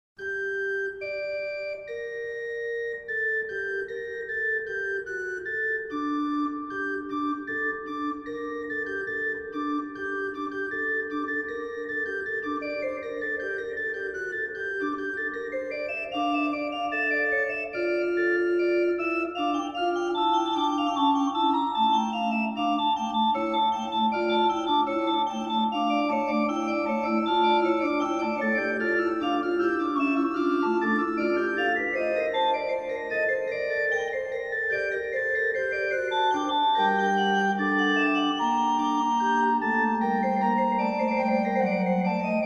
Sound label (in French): bourdon doublette reverb